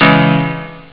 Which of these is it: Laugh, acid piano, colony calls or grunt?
acid piano